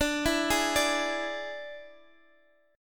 D Suspended 2nd Flat 5th D, Guitar, Half Step Down